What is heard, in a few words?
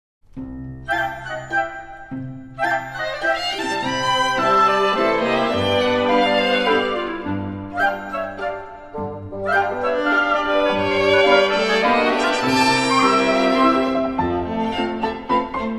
Menuet Of Innocence For Chamber Orchestra